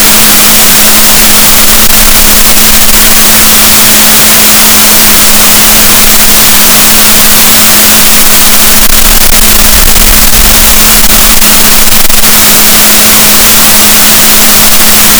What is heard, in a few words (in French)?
rorquals positif, gaussian noise, Balaenoptera Physalus Chile